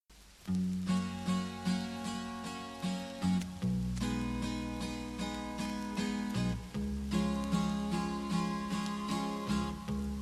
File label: Romance For Violin And Orchestra